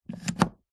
household mains plug australia plug into wall socket; zapsplat